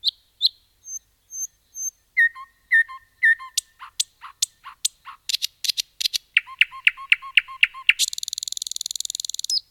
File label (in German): nachtigall klingelton gesang vogelzwitschern